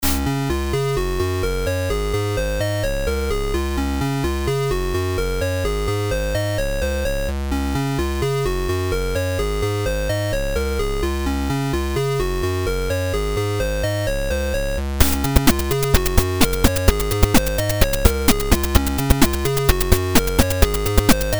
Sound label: Bad Speech PSG